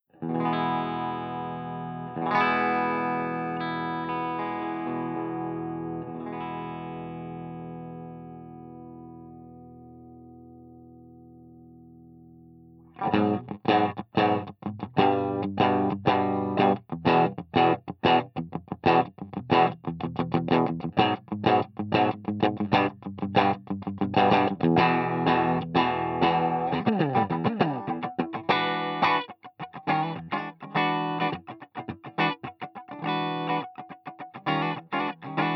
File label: BUCKINGHAM BRILLIANT MIDBOOST SC